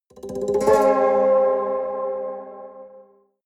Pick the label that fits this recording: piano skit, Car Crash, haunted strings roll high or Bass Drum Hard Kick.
haunted strings roll high